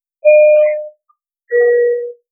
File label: ding dong